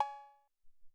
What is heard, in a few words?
Syn Tamb